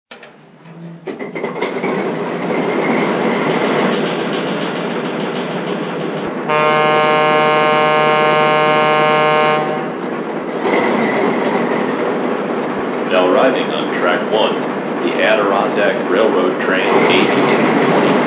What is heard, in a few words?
Alco RS-3